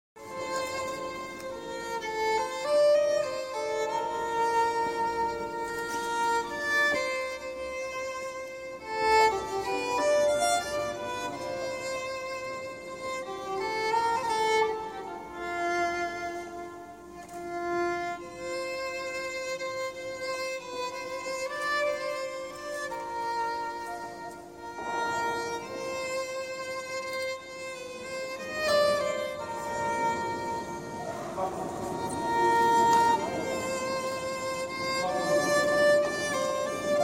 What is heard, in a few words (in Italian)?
violino solo ave maria